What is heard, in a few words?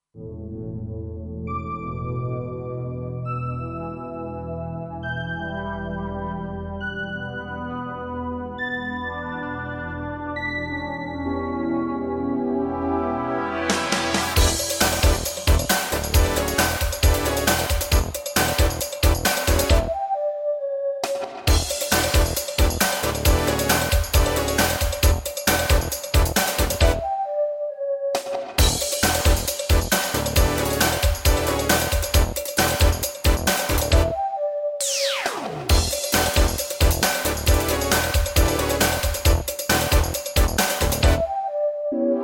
Sounds of Soul; Steinberg Absolute 5